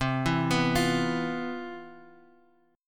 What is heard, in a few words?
C Major 11th C